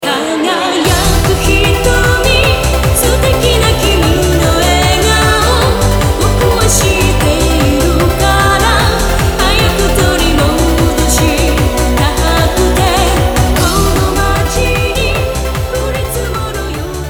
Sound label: white breath